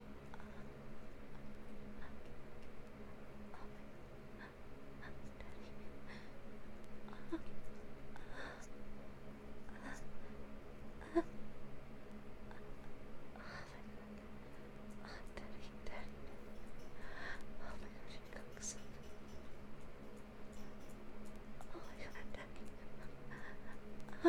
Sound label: Soft Moans; Soft Moans 4 Daddy